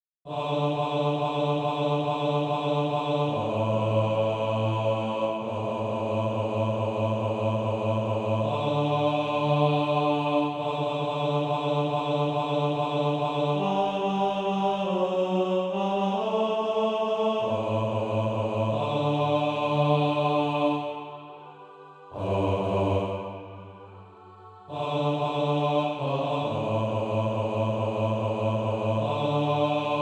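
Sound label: bass, Practise Music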